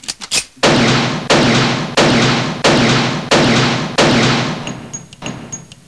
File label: pistol